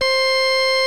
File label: 60's Organ